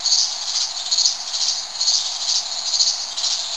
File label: crickets